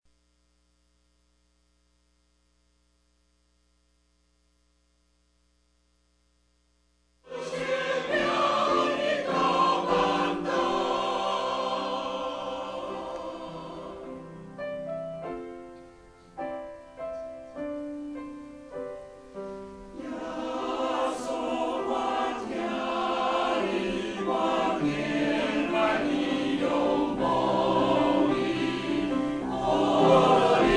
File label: Choir; taiwanese